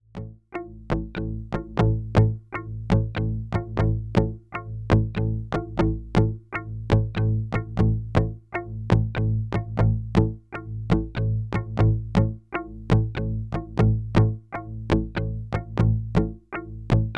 Morpheus Tam to Bell Wah Weird; Rossum Electro Music

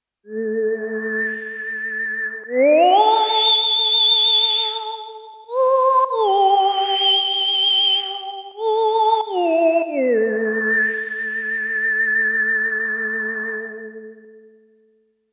Theremin Oct

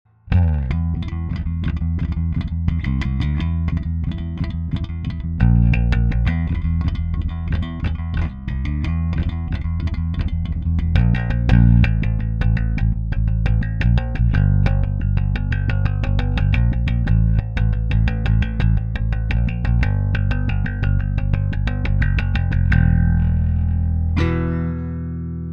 Active Jazz Finger Metal

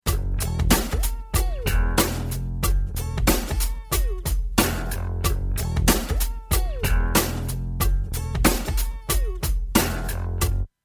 Medium Bass Treble

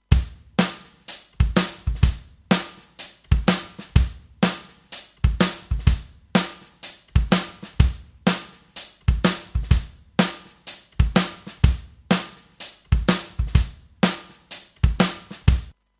Gyraf G23 S Drums Linear Tilting Right Tube

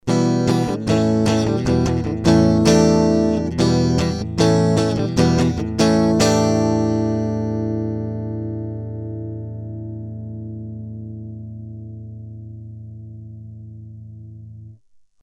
UA 476p gtr

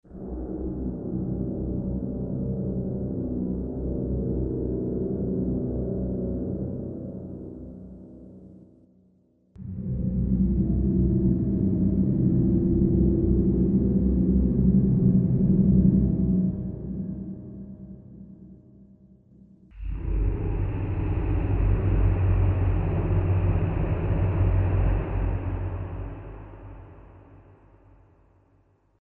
sub bass tubes layer einzeln; NI arkhis

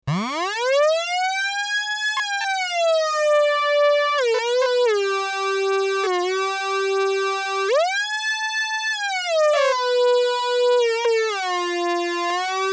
saw lead

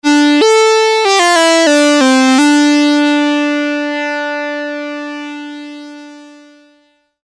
Polivoks pro lead

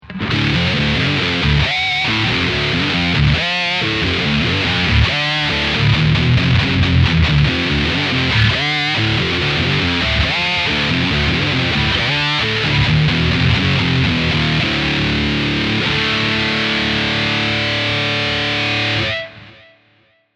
Metal Dark

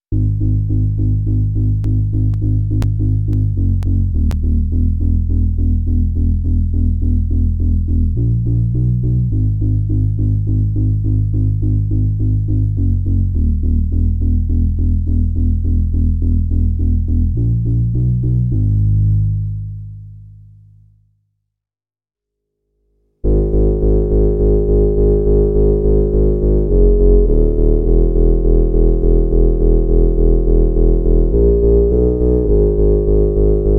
Dreadbox Erebus Reissue

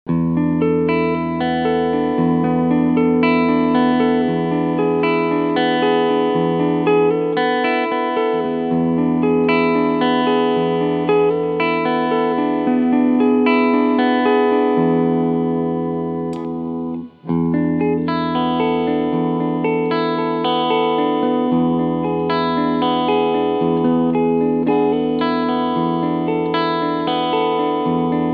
Gibson SG 61 Standard Maestro Cleansounds

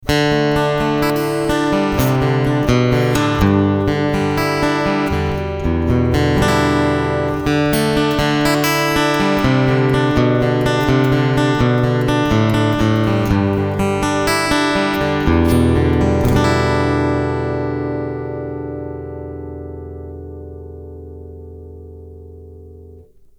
Picking Fishman EQ Full

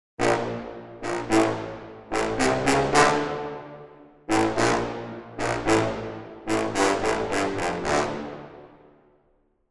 Brass Nasty; Albion One

